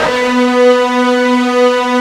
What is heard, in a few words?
FUZZ, Guitar; ULT FZZ LOOP; East West; Steve Stevens Guitar Samples Collection